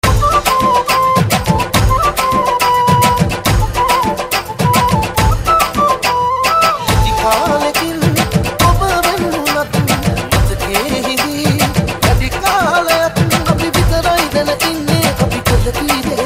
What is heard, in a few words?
Tamil Beat Mix